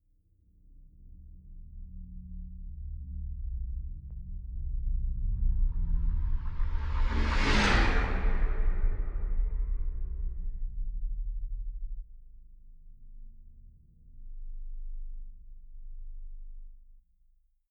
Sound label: spaceship small flyby loud; raumschiff